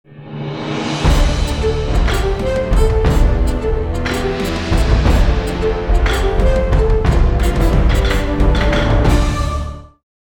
大气的背景音乐

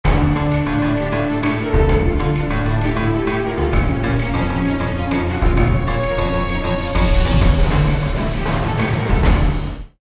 大气的背景音乐

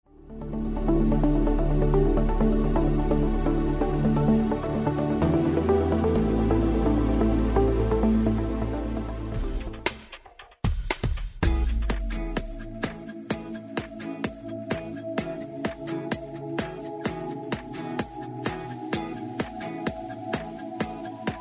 专题片背景音乐

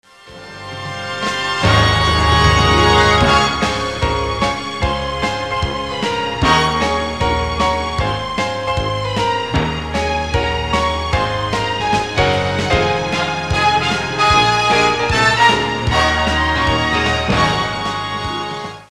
片头音乐

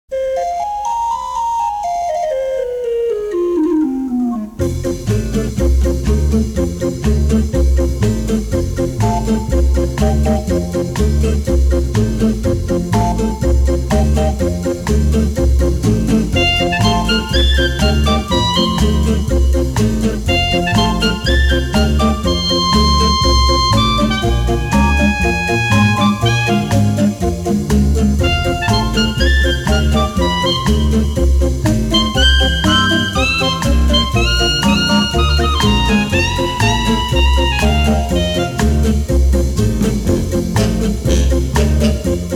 电影音乐; 奥斯卡电影音乐

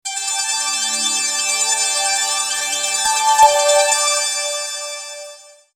片头音乐

